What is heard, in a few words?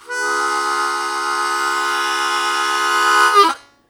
Blues Chord Attack C#1, Harmonica; Zero G - Harmonica